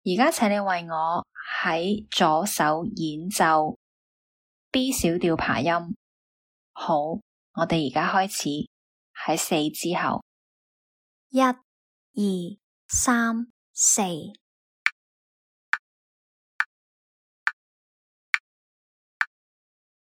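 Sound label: C minor arpeggio left hand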